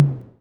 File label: Tom Low, Korg KR-55B